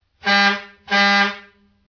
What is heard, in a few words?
horn